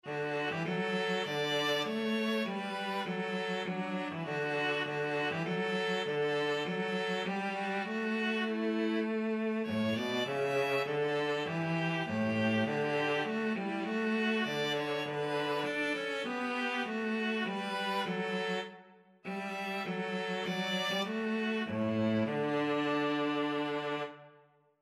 while shepherds, viola cello duet

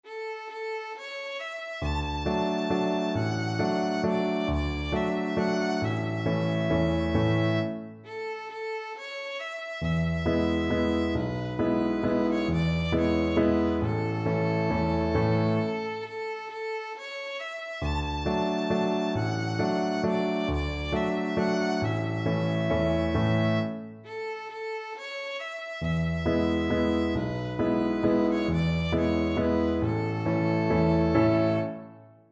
on top of old smokey violin

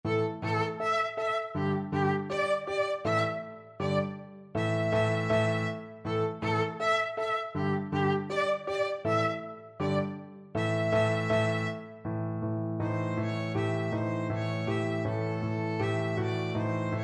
thumping blues, violin

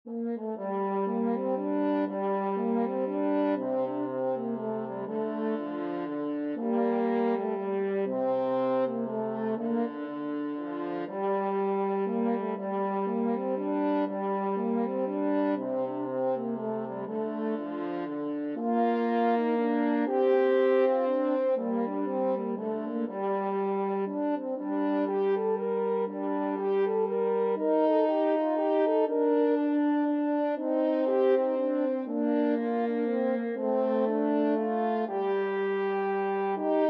french horn duet

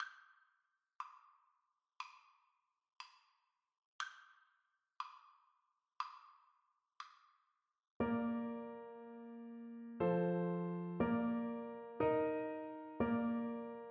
es ist ein ros, flute

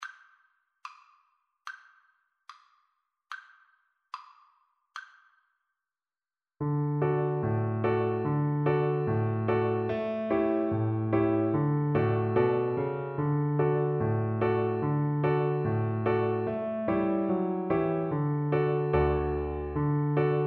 cello

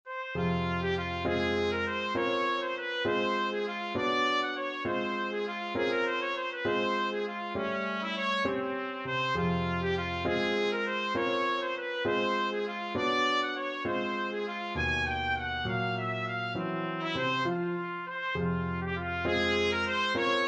young wizard TPT, trumpet

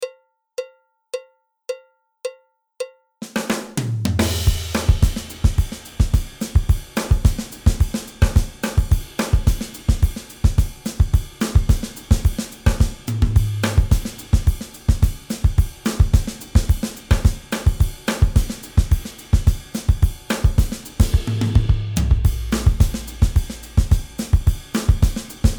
samba beat, drums

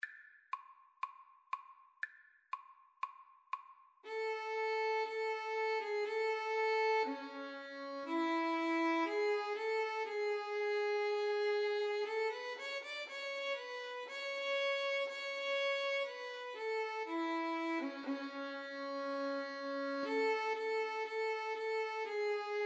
Violin Flute Duet

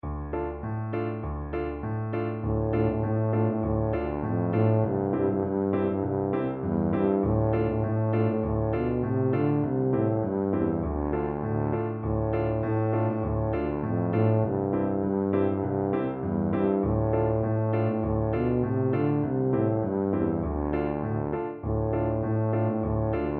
drunken sailor, tuba

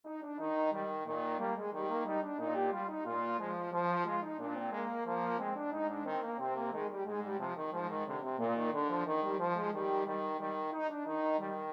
sailors hornpipe trombone; trombone duet

